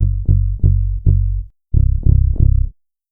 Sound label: Dub Bass, Bass Lines; Sonic Foundry (Sony Creative Software); Electronic Point Blank; Basses